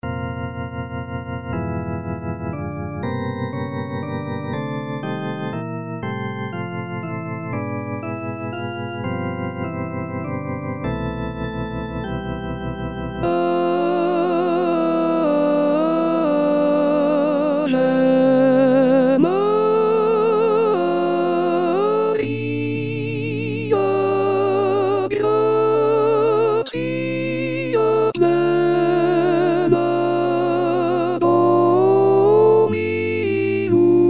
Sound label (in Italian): ave maria quaranta sop